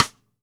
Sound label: Ludwig Black Beauty Snare Cross Stick; Sonic Foundry; Sony Creative Software; Vital Drums; 1969; Snare One-Shots